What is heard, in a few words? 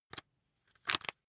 rock drill head attach regular; bohrer; soundpool